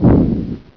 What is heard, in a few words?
flak